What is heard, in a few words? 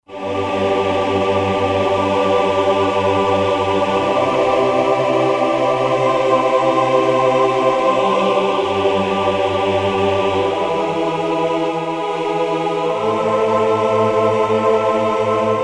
Choir, Roland SR-JV80-09; Roland SR-JV80-09 Session